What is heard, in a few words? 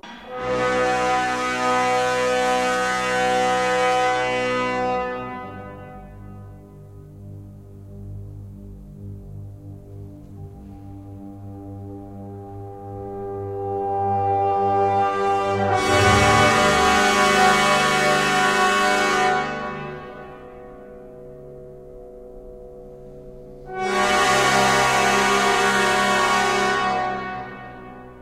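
Catacombae orchestra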